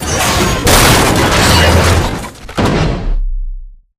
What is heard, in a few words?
melee chest punch; Titanfall 2